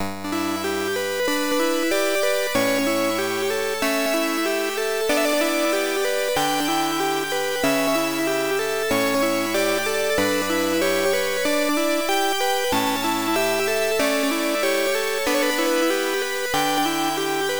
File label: All Clear; dragon egg; turbografx 16